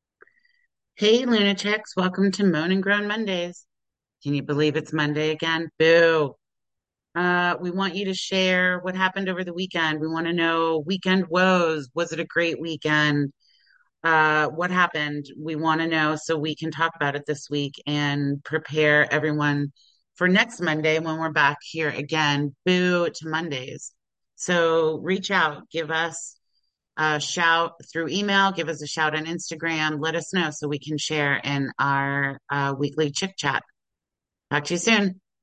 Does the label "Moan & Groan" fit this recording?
Yes